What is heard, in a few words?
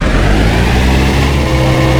hammer start